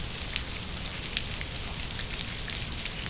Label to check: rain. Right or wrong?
Right